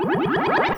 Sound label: Blubber